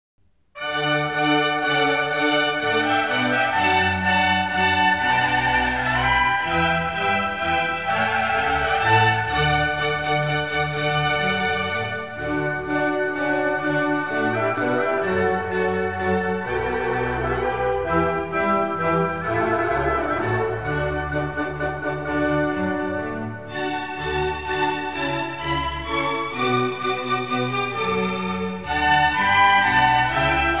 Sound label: Coro Minuet, Water Music in D, string quartet; Handel